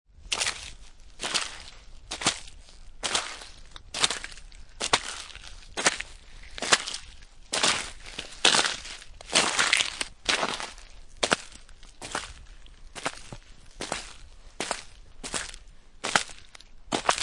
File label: Snow And Ice Footsteps Slush Wet Ice; Weather